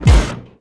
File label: biomech arm impact soft